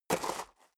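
step dress boots gravel medium single right, footsteps; schritte; menschen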